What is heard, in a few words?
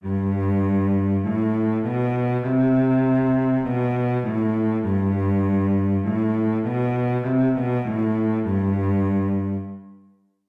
Cello Sight Reading Exercise; Moderato in G Major; SightReadingMastery